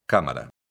camara

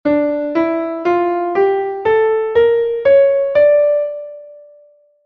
Escala menor harmónica, 1 bemol armadura, escala en re, con Do#

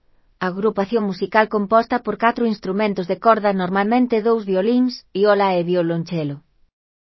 Cuarteto de corda

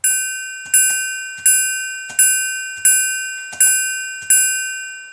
triangulo; Instrumento musical de batería

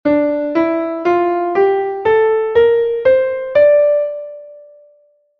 Escala menor natural, 1 bemol en armadura, escala en re; ESCALAS

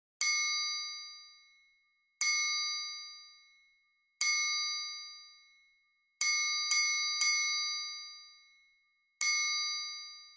Triangulo

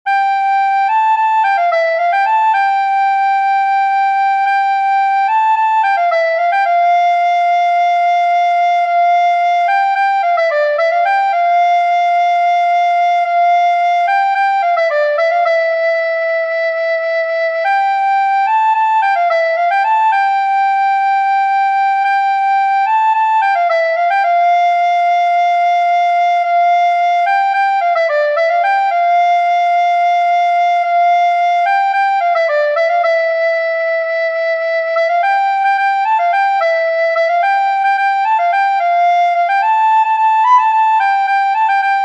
Rumba cinco marinheiros, frauta

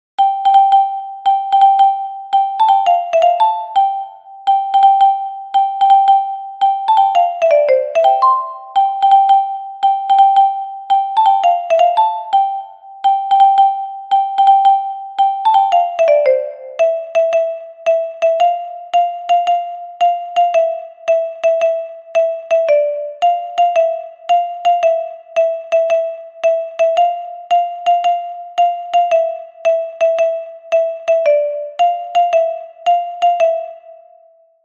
Muineira de Lugo Xilofono Soprano

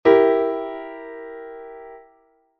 mi-solsib

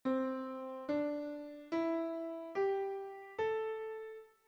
Pentatonica mayor; Asia

